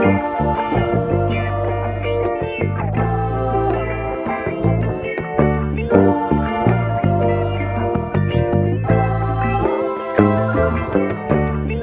guitar voice, loops; Phonky

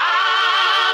dunk, Voice; Notes

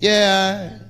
"yeah" sung

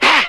ha; Voice; ooh ahh

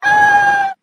scream female; ooh ahh

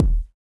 flapper, Kicks; Medium

